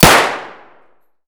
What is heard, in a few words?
pm indoor distant; Escape from Tarkov